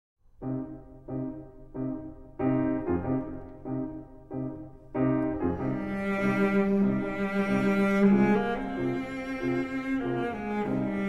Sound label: Piano Trio in E-Flat Major Andante Con Moto; Schubert; Piano Trio No. 2